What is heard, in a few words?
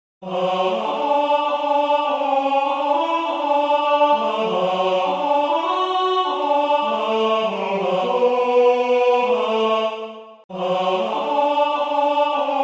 TENOR; Auf dem Strom; shubert